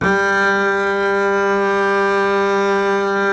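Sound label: string contrabass G; Miroslav Vitous; Solo Instruments VOL-2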